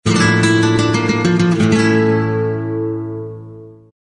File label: spanish guitar romantic accent